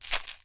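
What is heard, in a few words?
COW RATTLE, PERC; AKAI S6000 CD-ROM - WorldSounds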